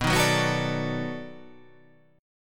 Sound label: B 7th Sharp 9th, down